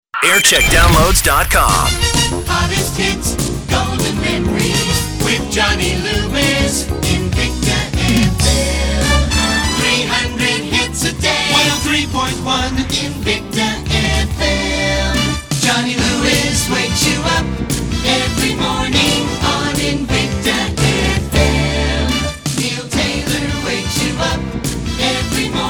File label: jingle montage; invicta